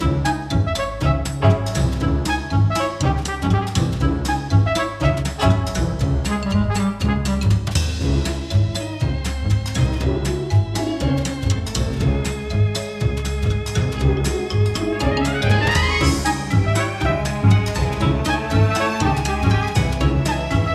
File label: Spring Working 120bpm; Don't Starve Giant Edition; PS Vita